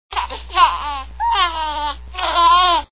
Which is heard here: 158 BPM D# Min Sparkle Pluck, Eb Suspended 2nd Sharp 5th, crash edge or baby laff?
baby laff